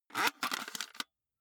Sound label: cassette into walkman